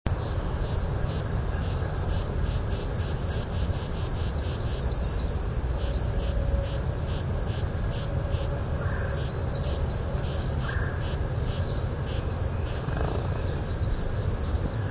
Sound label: titmouse wing display, tufted titmouse; birdsongs